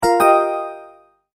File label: multimedia tone, Goodbye